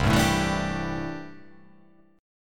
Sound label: C 6th Add 9th C down